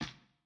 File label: Fight Fall; Game rip; MiSide